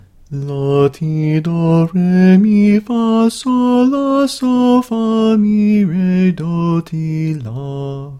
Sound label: Minor Scale